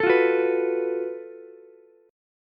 Gb Minor Major 7th Double Flat 5th, Ukulele, chord; strummed; 14,17,14,14; JGuitar